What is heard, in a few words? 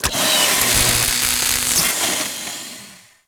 Welder Work Short; Fortuna